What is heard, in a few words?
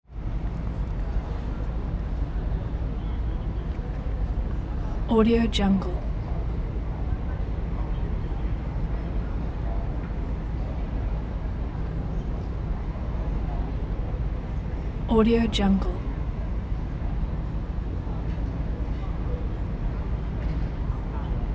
city main square midday ambience